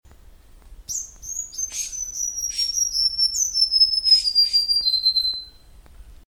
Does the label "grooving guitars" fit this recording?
No